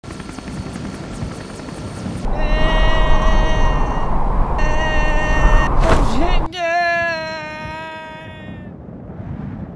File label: Parachute; Infiltrating The Airship